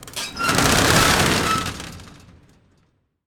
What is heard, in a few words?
SHATTER; 1000fx; Doors